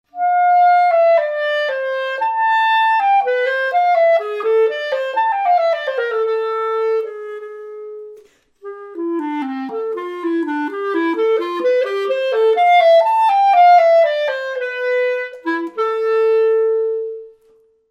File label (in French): clarinette